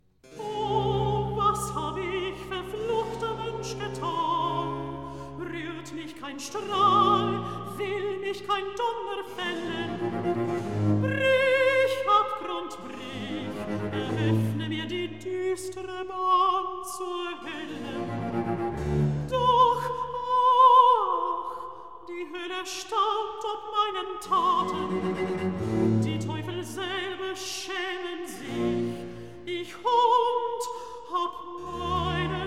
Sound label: Recitativo; Judas Oh, was hab' ich, verfluchter Mensch; Klassika; Handel Masterworks